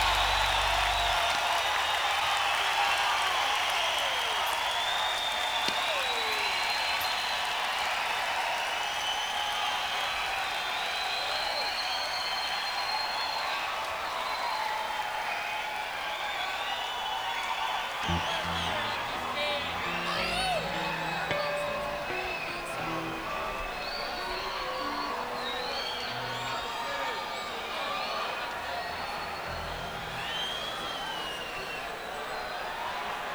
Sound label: Tuning